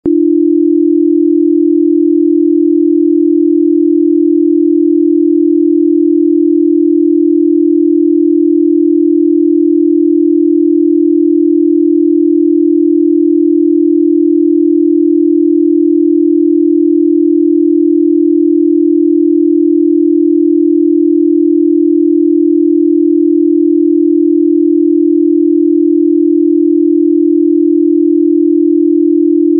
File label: Beta waves